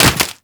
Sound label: Sword And Whip Finisher Puncture; Warframe; Weapons